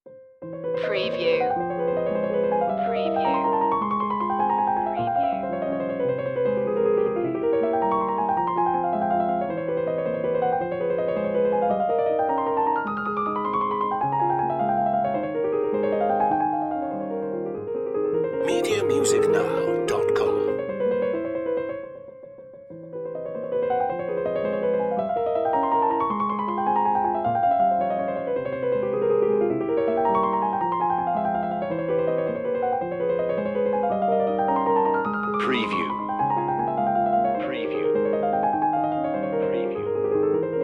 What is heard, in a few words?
etude f minor piano; chopin; etude 2